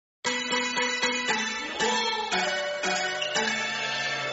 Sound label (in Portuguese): Tom Asiatico